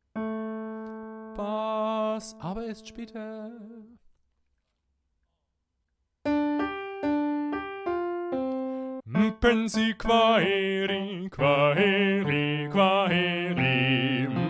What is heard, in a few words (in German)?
Bass allein; Kwaheri